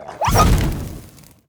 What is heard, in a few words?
enemy fire fox death